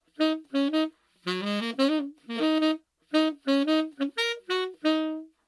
tempo sax

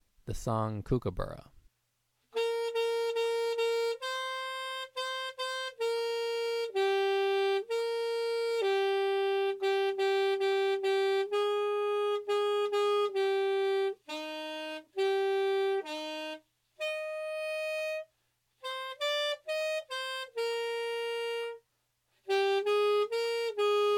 kookaburra alto saxophone

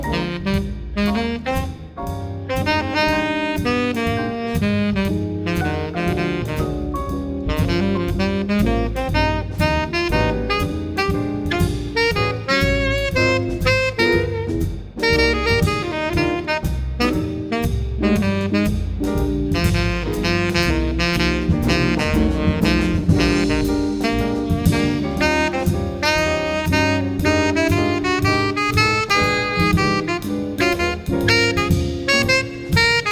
solo on centerpiece saxophone

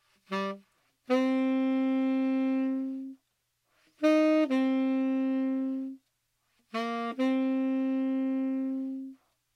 slow tenor saxophone

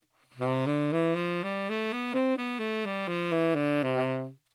C lower octave major scale, saxophone

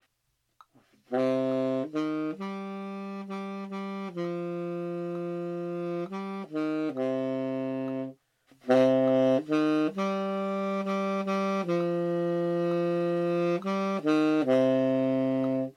tenor saxophone

